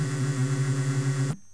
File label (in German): step, Schrittmotorsteuerung; Echtzeit Odin-3D 1/18 Mikroschritt-Sound von 3 Motoren mit 7.2 U/sec; National-Motion-Control